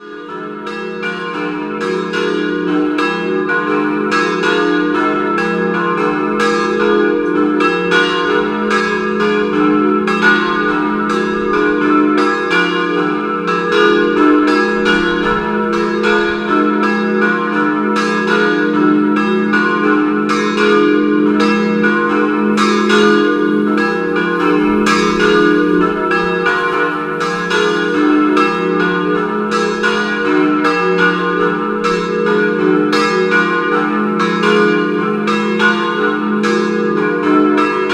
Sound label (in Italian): Basaldella, campane